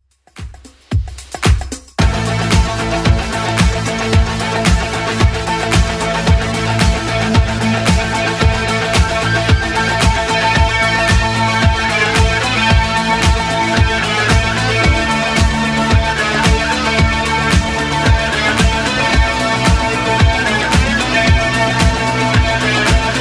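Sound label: Lucky Ones 80s Club 112 bpm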